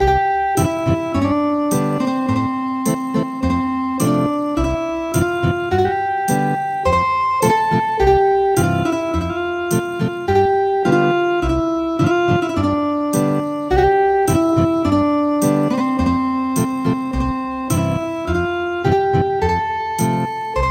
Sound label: Ethnic Guitar